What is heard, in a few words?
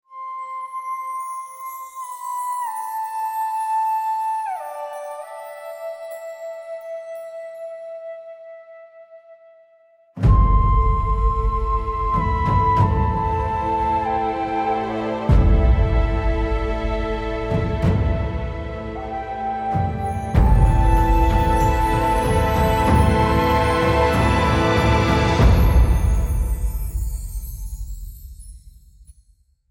mysterious ancient theme